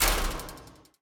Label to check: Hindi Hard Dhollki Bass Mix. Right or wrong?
Wrong